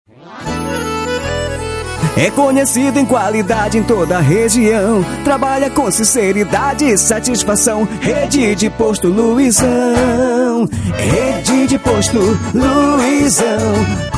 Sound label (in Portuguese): jingle posto